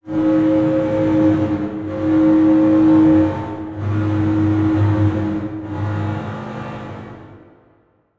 Transition Stab Dark Medium; Production Element

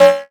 Multimedia Internet CD-Rom Flash Beep Electronic

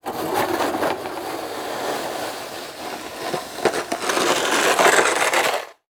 Weather Snow Shoveling Snow On Cement Long Slow; AdobeCC

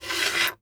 Coins Sliding On Wood Table; Foley

